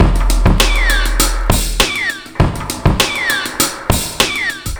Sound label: 100 bpm, breaks